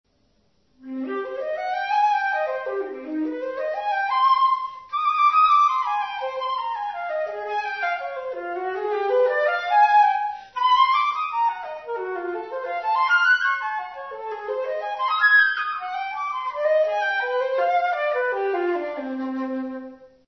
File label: c minor